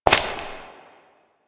Step Rock; Warframe; Koumei

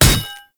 Ghoul Devourer Melee Impact; Warframe; Grineer Ghouls

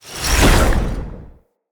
Dendrit Staff Caster Cast Heavy; Weapons; Staves

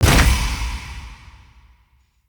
Bonus Damage; Weapon Impacts; Sweeteners; Virtue Bonus

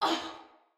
Pain, Male, en; Battle; Barks; Dialog; Sinecure Banneret

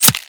Arrow Impact Flesh; Warframe; Bullet Impacts

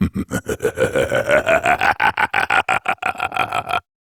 Laugh; Warframe; Tyl Regor Boss; Efforts

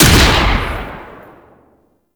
Arch Railgun Charged 2D Shot; Warframe; Archwing

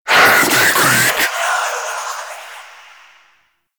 Super Life Screams; Warframe; Dante

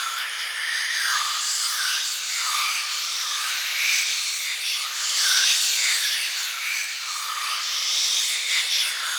Choice Whisper Loop; Warframe; The War Within; Mountain Pass Return Peak; Cinematics

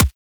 Weapon Punch Pistol; Warframe; Weapons

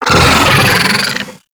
Pet Vocal Angry; Warframe; Alad V Pet

